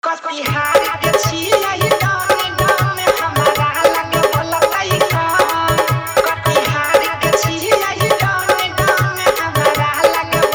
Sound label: Maithili Bhojpuri Dj Song Fully Dhollki Bass Dance Mix